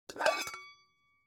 Kitchen pan open; phong bep

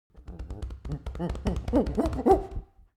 Excited Monkey thumping chest; khi

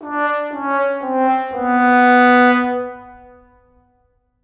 Sad game over trombone; buon

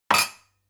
Glass shelve; phong bep

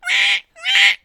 Creaking cartoon bird calling

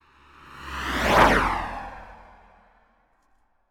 Fast car drive by; xe dua

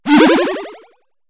Video game spin jump; nhay

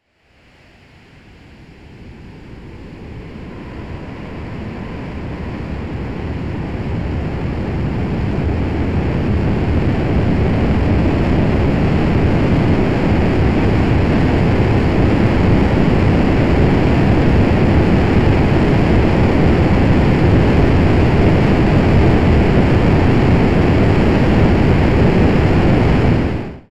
Space Shuttle rocket ignition start

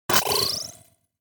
Cartoon Sci Fi jump; nhay

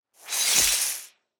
Thin icicles spell; ao thuat

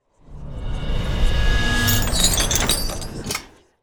Arcane chain spell; ao thuat

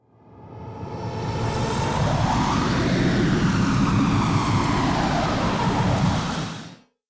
Sci fi rocket engine

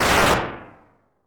Fast game explosion

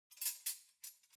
Cutlery knives handle

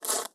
Paper quick slice

